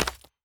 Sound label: mine, Chopping and Mining; Free Fantasy SFX Pack By TomMusic